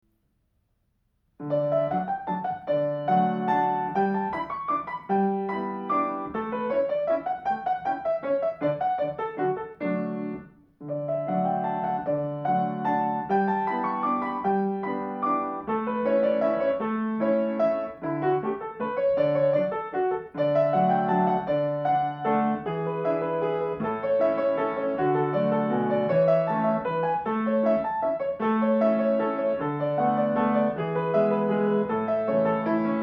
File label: Piano Deh vieni alla finestra